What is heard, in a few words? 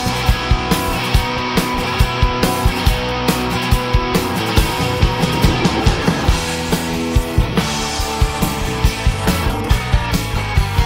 with Accompaniment Only